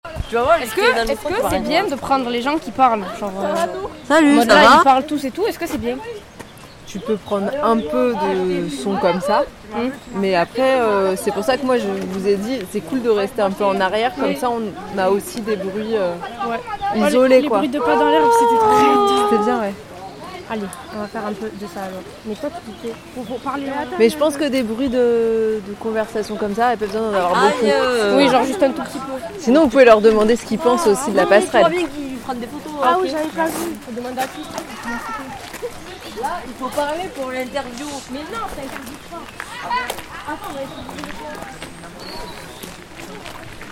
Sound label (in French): AMB SENTIER FIN OISEAUX; collège Vallée du Thoré